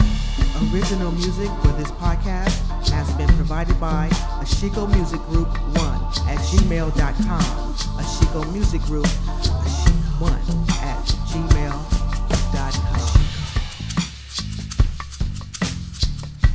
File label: Music Group; Ashiko Music Group